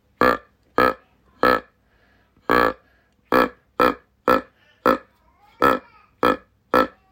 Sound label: Grunt, deer call